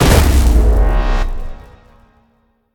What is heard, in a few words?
deployable explode; smite; raijin